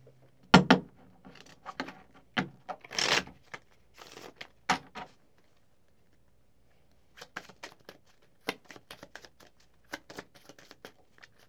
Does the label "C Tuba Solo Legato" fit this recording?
No